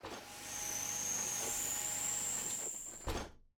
Hub Train Door Close

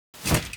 Mantis Lord Jump Off Cage

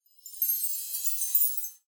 Card Pack; gamerip

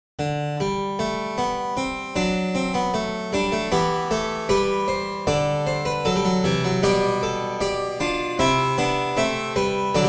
Harpsichord Goldberg; Elin

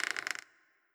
Sarco Squeak

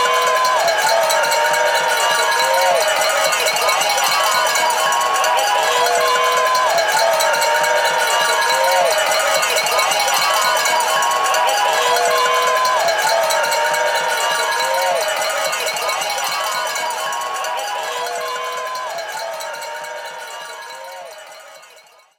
Sfx Wintergames

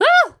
Yelp

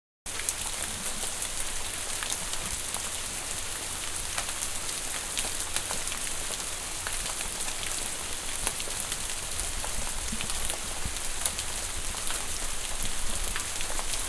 Rain Heavy On Porch

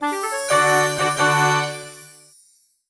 Masked Ball Fanfare

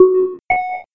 Gameshow Hit; Burnout CRASH!; PS3; Xbox 360

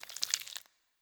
Servant move Bones

